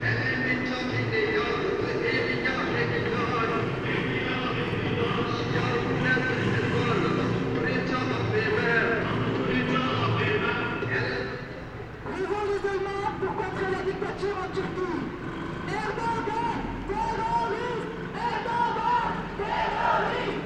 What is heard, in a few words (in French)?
manif kurde